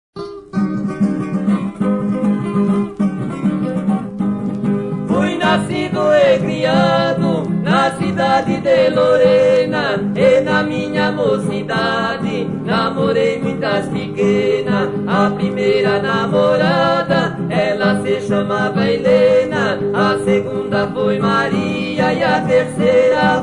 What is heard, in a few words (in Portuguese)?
Sertanejas Classicas